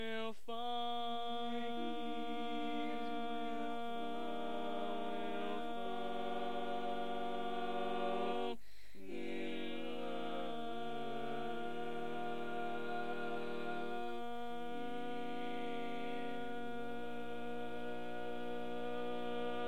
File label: All Parts, Barbershop Tags